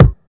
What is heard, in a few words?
studio bass drum sound f key; kick drum samples